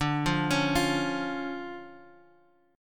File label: D Minor Major 7th D Guitar Half Step Down